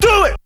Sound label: VOX; ZERO-G; Datafile